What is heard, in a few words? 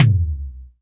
SC40 BD, Pearl SC-40 Kicks; drum machines